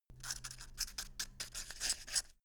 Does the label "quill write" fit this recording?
Yes